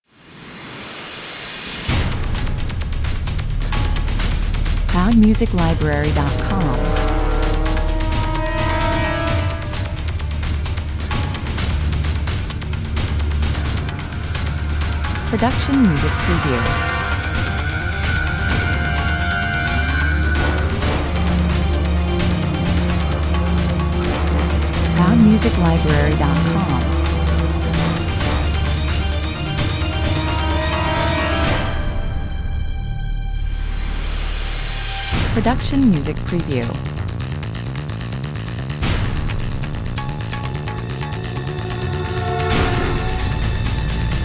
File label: run and shoot 130 bpm